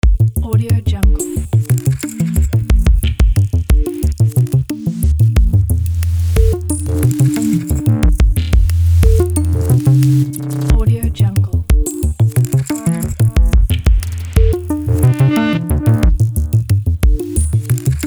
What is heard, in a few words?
abstract loop; hotloops